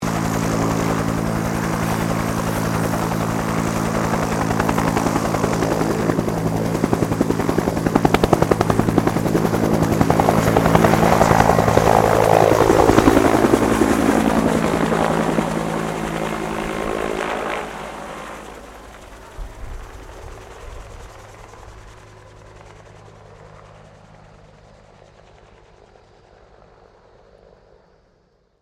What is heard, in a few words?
HELICOPTER 1967 BELL UH1 IROQUIOS TAKEOFF; RAN UH-1 Iroguios; Vehicles; Aircraft; Helicopter Sound Effects; Helicopters (Sound Effects:Vehicles)